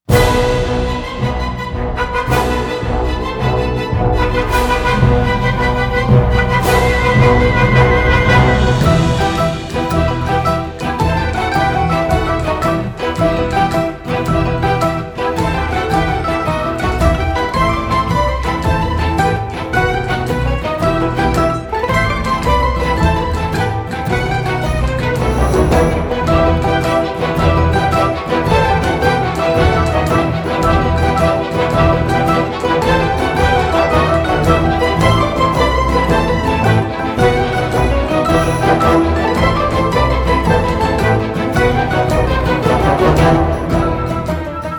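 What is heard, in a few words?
music Battle; DGN Freehold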